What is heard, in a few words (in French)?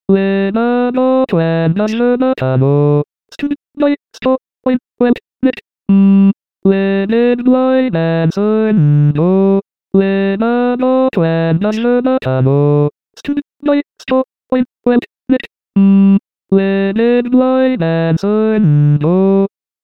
basse